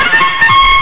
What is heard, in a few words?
cartoon charge; cartoons